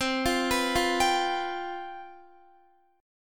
C Major 7th Suspended 4th C down Guitar; arpeggiated

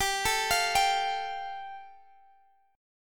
G 7th Suspended 2nd, Guitar Drop D

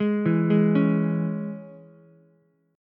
Ab Suspended 4th Sharp 5th chord, Ukulele; Absus4#5 arpeggiated; 1,4,4,4; JGuitar

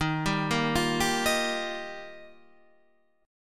D sharp Major Add 9th, Guitar, Standard; D#add9 arpeggiated; 11,10,8,10,8,11; JGuitar

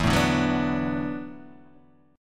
E 9th Suspended 4th E down Guitar Open D

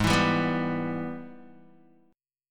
Ab Minor Major 7th Sharp 5th, down, Guitar

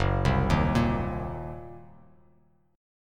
F sharp 6th Flat 5th F sharp down; arpeggiated; JGuitar

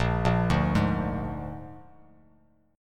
A sharp 7th A sharp, Bass Standard; A#7 arpeggiated; JGuitar

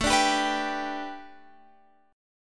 B Minor 7th Sharp 5th A, guitar chord

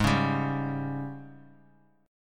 Ab Major 7th Suspended 4th Ab down; strummed; JGuitar

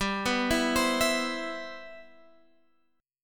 G 6th

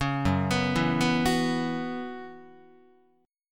Gb 7th Suspended 4th Gb down Guitar, chord

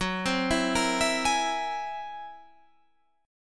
F sharp 9th Flat 5th F sharp Guitar Standard; arpeggiated; JGuitar

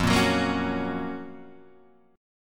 Gb Major 7th F, guitar

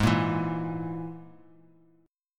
G sharp Suspended 2nd Sharp 5th, chord; G#sus2#5; strummed; JGuitar

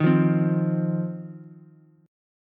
C Minor Double Flat 5th C down Ukulele; Cmbb5 strummed; JGuitar

